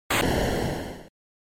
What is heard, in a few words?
SNES RPG Magic Lightning